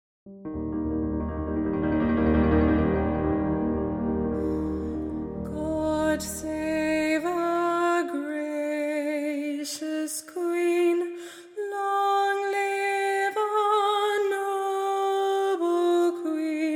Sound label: national anthem sop